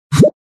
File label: switch; ludo